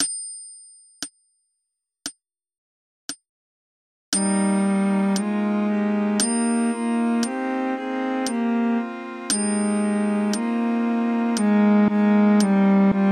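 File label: Ubi caritas soprano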